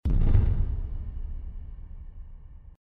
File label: sonic boom